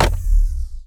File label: Panel Circuit Wrong; Among Us